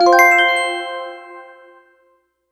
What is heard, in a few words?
changes, notify; NovaRealm